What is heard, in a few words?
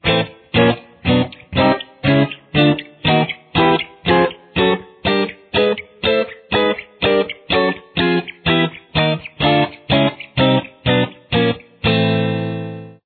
A minor barred exercise, guitar